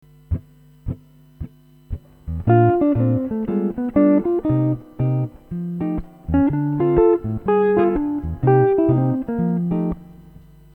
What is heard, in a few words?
Rhythm Changes Licks Etude, guitar